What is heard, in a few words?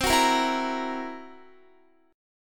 C 7th Flat 5th C down Guitar; C7b5 strummed